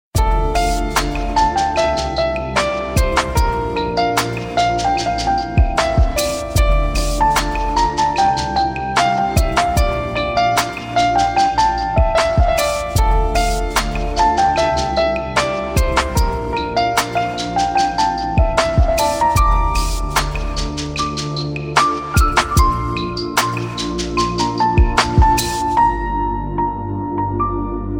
cool piano music

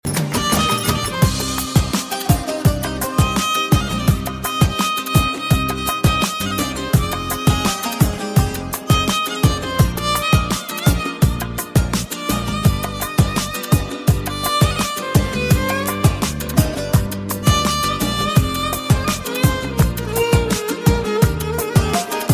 arab melody

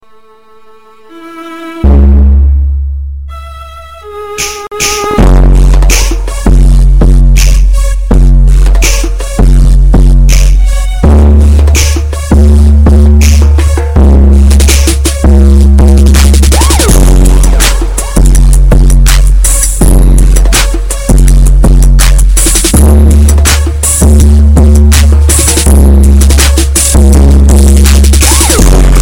mega bass

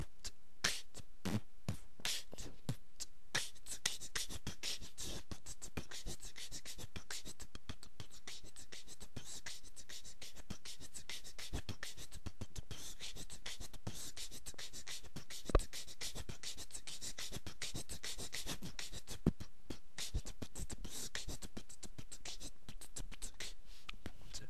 beatbox attempt

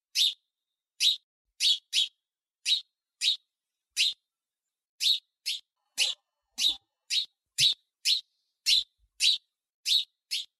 birds sound

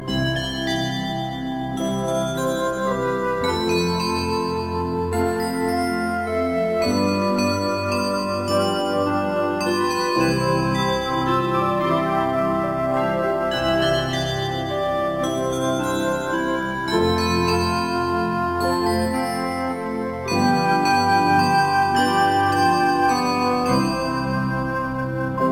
christmas bells

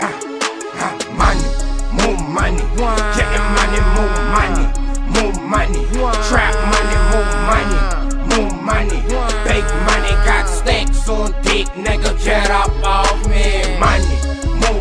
trap

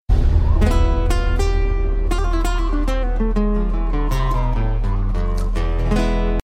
guitar sms

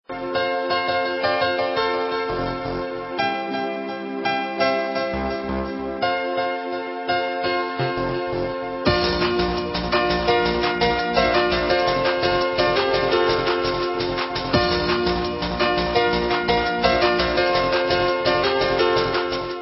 techno christmas

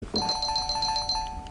ring bells

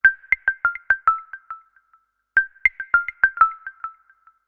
nokia lumia ring

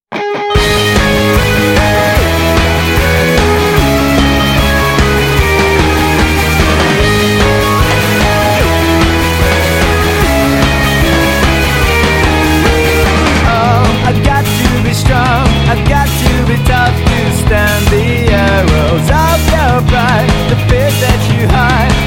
the moog

